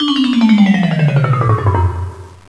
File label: TIMES UP